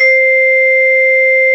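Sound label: Vibraphone Oct C5, Fairlight CMI Mallets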